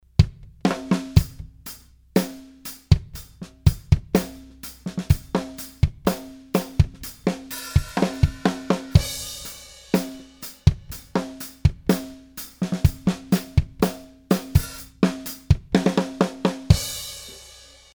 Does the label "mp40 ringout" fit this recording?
No